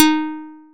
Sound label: plucked, programmable speaker